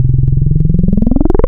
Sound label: Acid, wavetables, Analog; Massive 3-Analog-Electric